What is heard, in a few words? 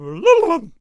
Bard Attack Vocals